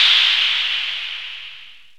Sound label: ELECT PERC; OMI - Universe of Sounds